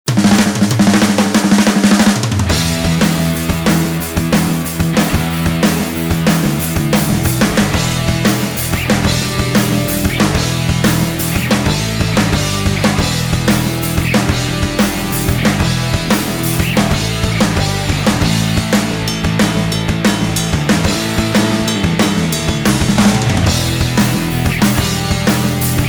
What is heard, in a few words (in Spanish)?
Banda Sonora Music Rock